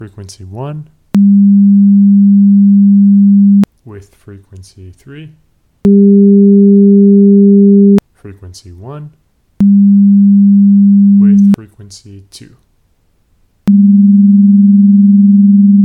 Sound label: frequencies